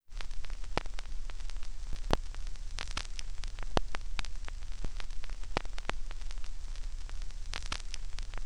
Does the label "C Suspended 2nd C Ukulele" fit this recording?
No